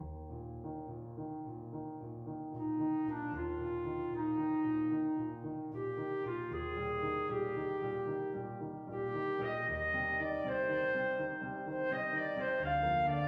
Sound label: clarinet sonata; saint-saens